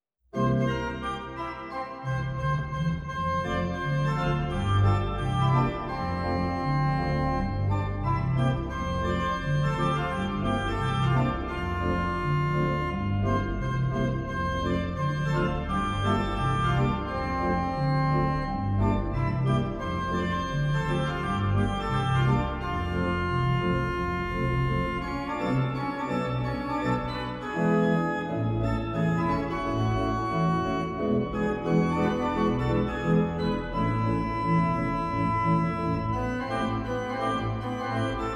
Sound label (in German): Orgelversion